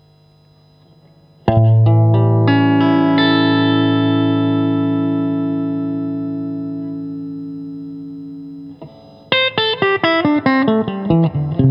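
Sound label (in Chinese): 60S OCC 单晶铜 清音 2档, acoustic guitar; kindman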